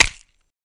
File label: Celery Generic Snap; gore stabs & eat